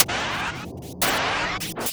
SHARP; USB Soundscan vol.33; AKAI; Disjointed Textures

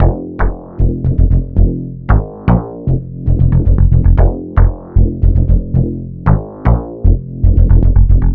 Bassline; Drums & Drones

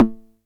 909 SD Tape; Goldbaby Tape808 & Tape909; The Tape 909

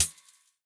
Hat Closed; WM+NM=MATRIX

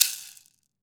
wood shaker; AKAI S-Series CD-ROM Sound Library VOL-5

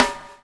BUBL, BUBBLE; Spectrasonics - Backbeat

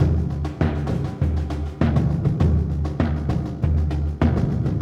Surdo; Ambient Grooves; World Groove

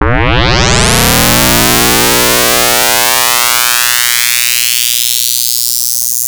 LFO Impact, Analog; Industrial; LFO FX

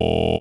perc voice, Roland MC-909

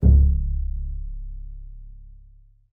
PIZM C#1 -L, BASS PIZZ; Xsample VOL-5

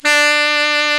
TENOR SAX

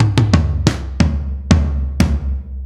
Fill, Drums, Eclectic Electronica

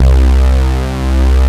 JP BRIGHT, SYNTHS; Pro-Rec - Synthsonic

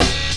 HIT, Hits & Brass

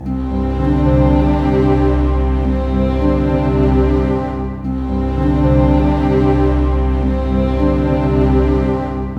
CLOCK; East West; Scoring Tools; ORC; Clock Time

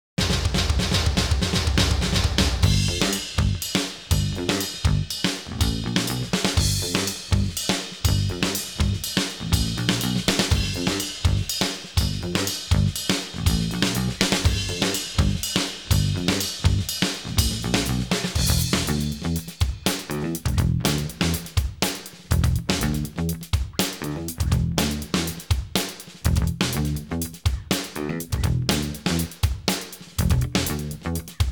drum & bass; KORG - Sound Sampling Collection VOL-5 - Drums & Bass Fusion Funk Effect

